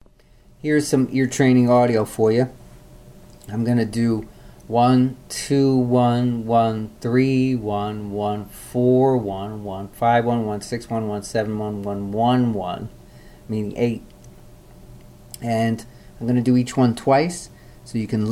ear training intervals above and below octave major